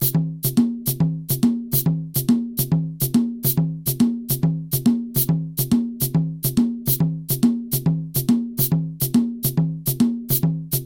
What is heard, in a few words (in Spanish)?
brikamo opiapa